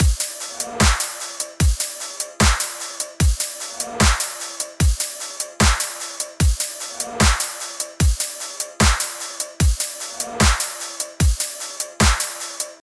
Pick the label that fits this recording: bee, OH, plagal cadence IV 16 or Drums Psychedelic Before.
Drums Psychedelic Before